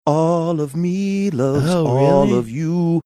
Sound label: singing